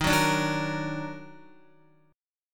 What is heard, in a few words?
Eb Minor Major 7th Eb down Guitar, chord; EbmM7; strummed; JGuitar